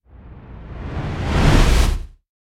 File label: Whoosh Fat Storm - reversed; Hollywood Edge; sound designer's toolkit v2